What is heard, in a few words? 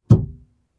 Object dropping in trunk